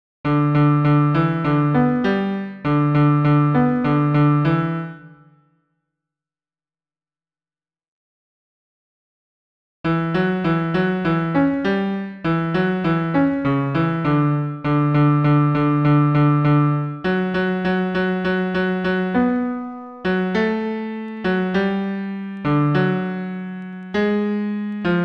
tango bas pian seul

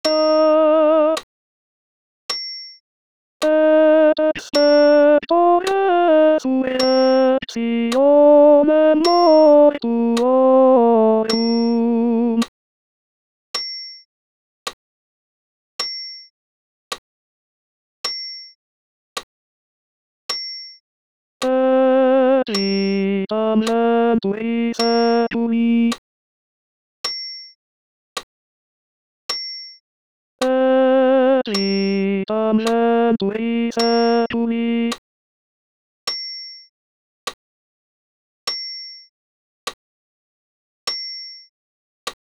Credo ten voc seul

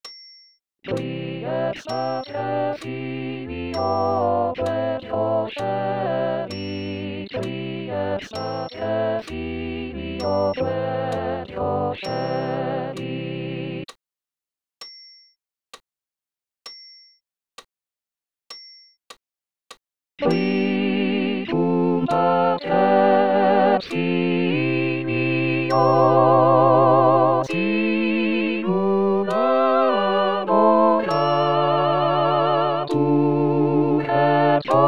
Credo tous voc

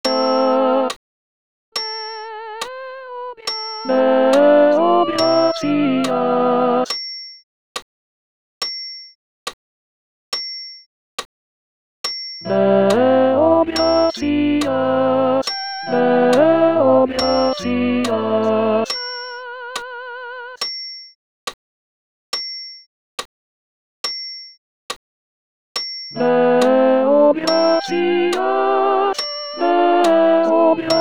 Ite missa est ten voc acc

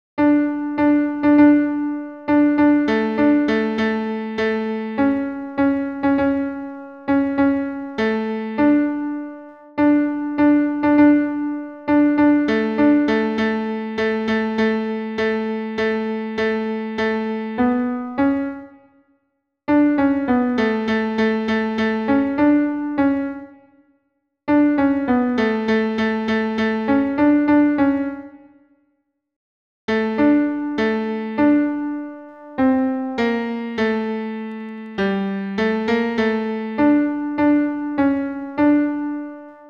ten pian seul

